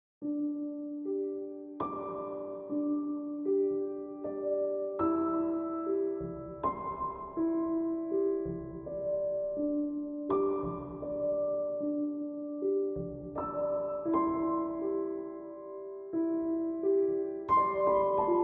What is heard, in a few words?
piano vague